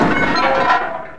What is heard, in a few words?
Game, 游戏音效; 特酷声音素材库